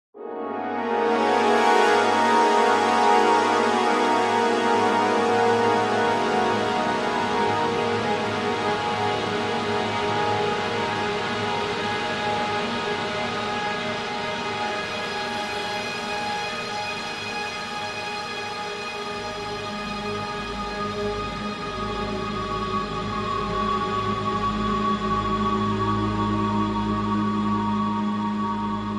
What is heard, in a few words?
sound design cinematic orchestral dramatic horn ensemble crescendo stab